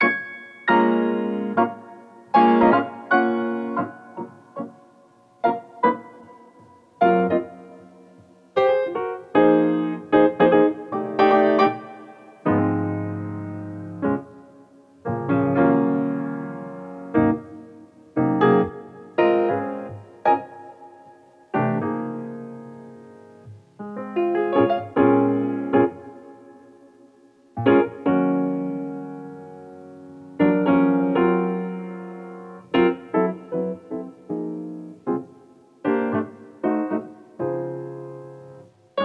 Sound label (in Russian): Fortepiannaya Muzyka